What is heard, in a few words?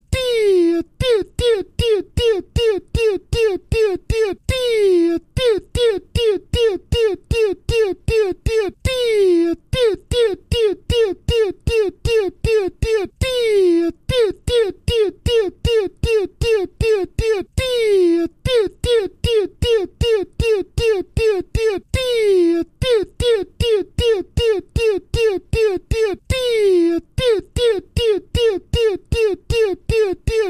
alarm